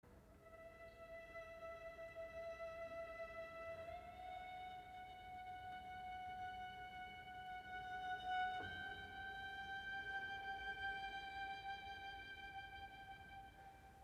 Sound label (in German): Vokalmusik